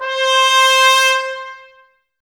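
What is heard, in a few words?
trumpets C, brass; 90 s Sample CDs; Miroslav Vitous; Woodwind Brass Ensemble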